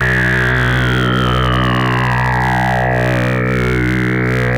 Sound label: CZ SWEP, Casio CZ